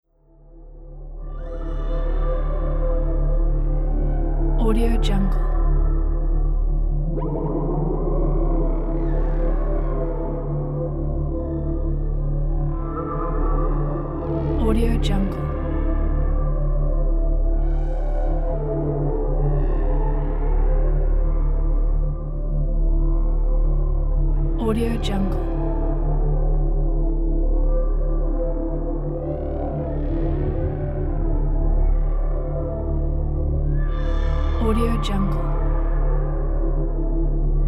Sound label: mysterious rumbling underwater ambience